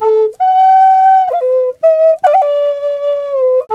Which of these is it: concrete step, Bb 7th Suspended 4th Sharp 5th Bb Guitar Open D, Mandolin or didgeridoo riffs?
didgeridoo riffs